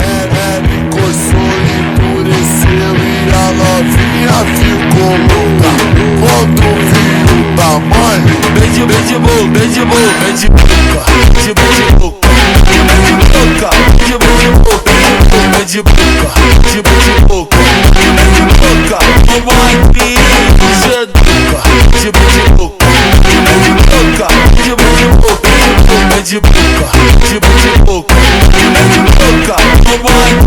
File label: funk slowed version